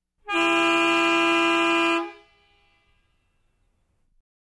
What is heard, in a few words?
Sedan Car Honk